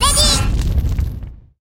tower attack ready

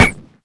hit

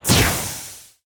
event board lap count

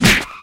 hit

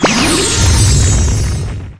gear gacha ball

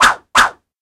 swish

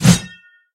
att sword short

